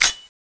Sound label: clip out; cso; skull6